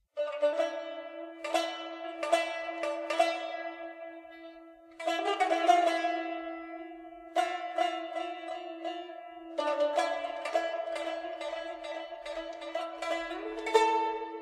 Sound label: shur arab; leyli; scene2